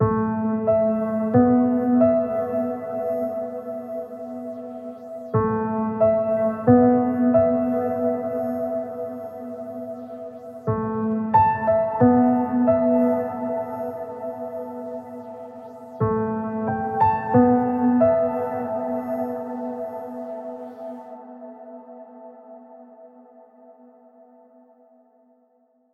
Piano - A 90BPM; STEMS; Ghosthack; SkyRealm; Mood Kits; Mood Kit 02 - Afterlife

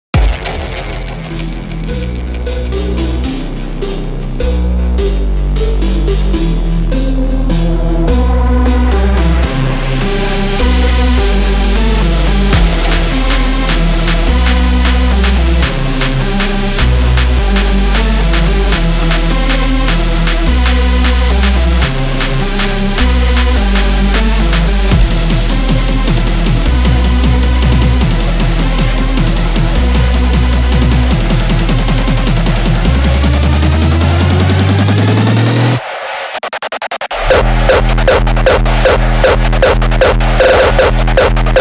Kit Emin 155 Mix; GhostHack

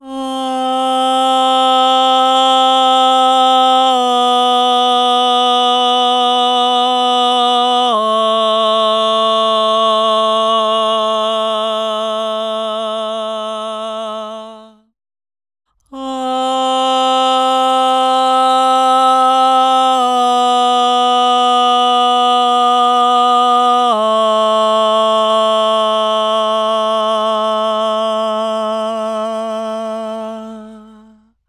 Ah Vocals - Am 120Bpm; Construction Kits; Ghosthack; SkyRealm; Kit 05 - Heart Of Fire

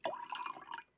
Pouring Liquid; Fairlight Sound Lib

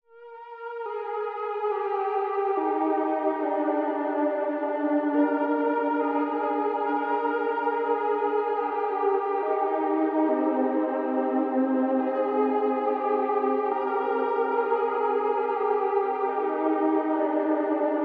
140 BPM C Min Lead, Ambient; Melodies; Loop Stems; Cymatics; GHOST (Beta Pack); Boulder

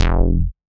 Bass F, techno synth shot; Bass One-Shots; Ghosthack; 45 Free Techno Synth Shots 2025

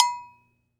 Chacha Bell, World Percussion; Cymatics; PHARAOH Beta Pack; Pharaoh Premium Drum Samples (BETA)

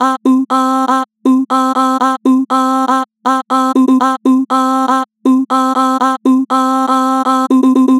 Vibrato 144 Chop, Vocals; Harmor Vocals

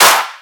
Ice Clap, Dubstep; One-Shots; Drums; GhostHack; Ghosthack - AC2024 - Day 13 - Dubstep Sounds 2025

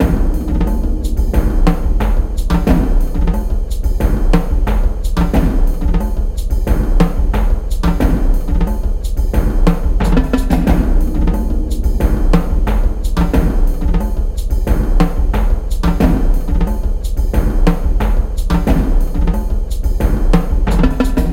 90BPM, Percussion Kits; Ghosthack; SkyRealm; Peace Treaty

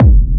Techno Kick; 30 Techno Kicks 2025; Ghosthack

